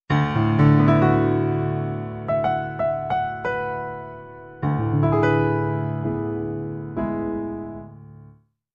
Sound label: C7 Ambient